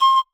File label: marimba child; ゆめ2っき